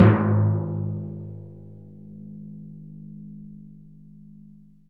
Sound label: PRC TIMPAN; Roland - LA Composer vol. 1